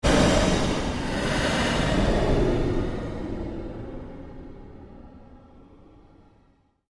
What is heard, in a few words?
Created Pulse Long Sweep, Sweeper Sound Effects; Sound effects created by manipulating other sounds